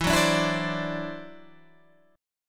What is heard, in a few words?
E 7th Suspended 2nd Sharp 5th, E, down